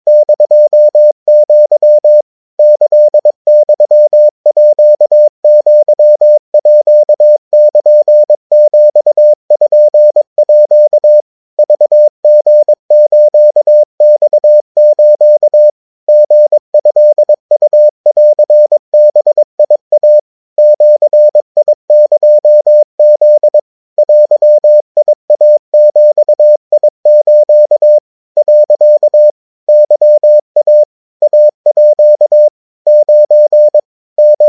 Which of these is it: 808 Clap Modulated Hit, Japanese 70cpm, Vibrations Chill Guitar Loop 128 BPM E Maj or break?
Japanese 70cpm